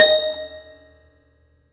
hard D#5 127; Ableton Foundation FreePacks; Foundation Glitch Toys & LoFi

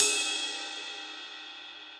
Ride Mid Classic Muffle; Drums; Drum Hits; Standard Hits; Ableton; Session Drums Studio

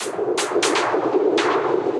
Metal Delay, Effects; Drive and Glow; Ableton; Factory Packs

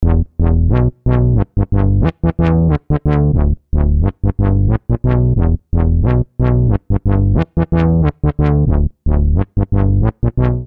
E Minor 90 bpm, Bassline; MIDI Clips; Tonal; Freak-o-saur; Ableton; Factory Packs; Chop and Swing

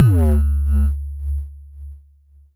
long Bass; kidGlitch; Ableton Foundation FreePacks; Wicked Kits

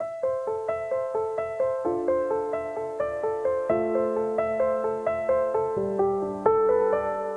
Nostalgic Piano, Dmin 130 bpm; MIDI Clips; Tonal; Motifs; Ableton; Mood Reel

